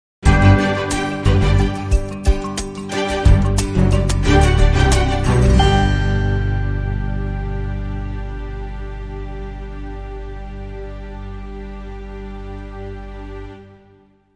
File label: Sting